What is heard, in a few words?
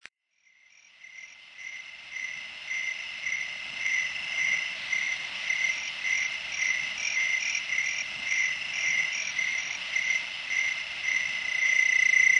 Singing Cicada